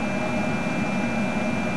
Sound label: Motor Generator